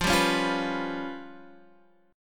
G 7th Flat 5th

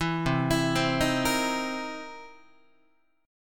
C 7th Sharp 9th C Guitar; arpeggiated; JGuitar

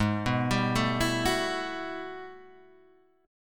G Major 13th Ab; arpeggiated; JGuitar

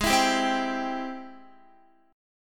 Ab Augmented Major 7th Ab Guitar; strummed; x,11,14,12,12,14; Ab+M7; JGuitar

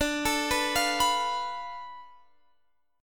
D Minor 6th Guitar Open F; Dm6 arpeggiated; x,21,21,18,20,18

